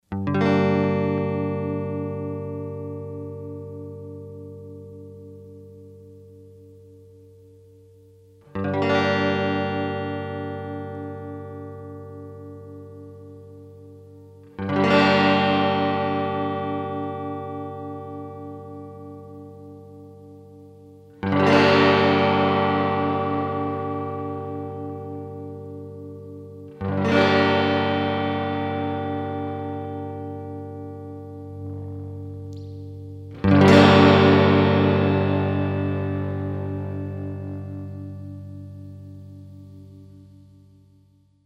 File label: bogen mpr 6 guitar; dr zee workshop